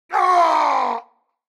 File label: Player Fall; Platformer1